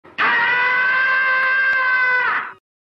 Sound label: scream, aaaaaaa aaaa